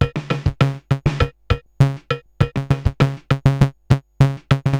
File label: Moog Freqbox RX17 (100BPM), cyborg beats; musicradar